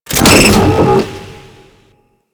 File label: Console Purified; Ambience; Warframe; Infested; Golem Trial